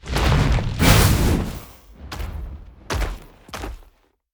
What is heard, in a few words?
Monster; Zenless Zone Zero